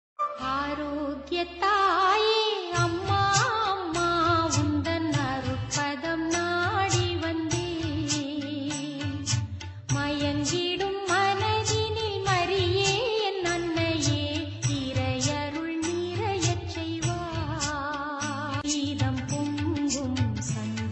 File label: tamil christian song